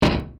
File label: heli dmg; mcheli